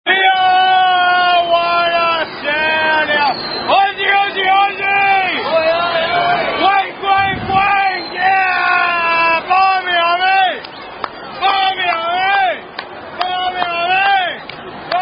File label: Aussie Aussi Oy Oy Oy; New Year's In Melbourne